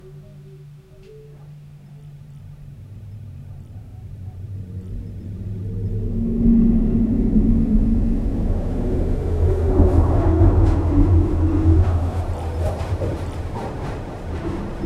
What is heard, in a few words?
glass elevator going up